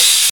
clean hi-hat sample a sharp key

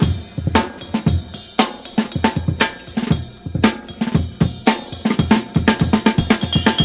116 bpm drum groove f key; Duration 0:07; Samplerate 44100 Hz

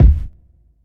long tail reverb bass drum one shot a sharp key

